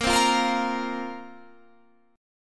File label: C 7th Suspended 4th